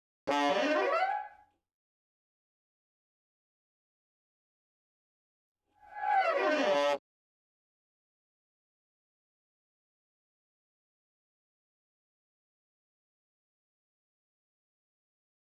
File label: Hanging Eleven Reggae 8